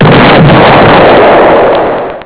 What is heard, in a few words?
demolish